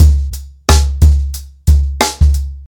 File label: 120 bpm drum beat c sharp key, kick tuned to the C# note